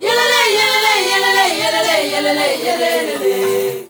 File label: ZULU CHR, FEM. CHOIR